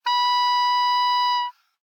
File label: Oboe ff B5